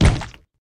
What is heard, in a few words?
Ram